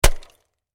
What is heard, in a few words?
Impact Axe Chop Log; Axe Sound Effects; Axes (Sound Effects:Tools); SFX Bible 6